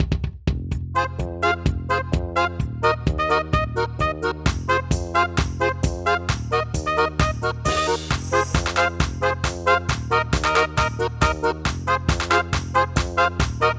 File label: cossack dance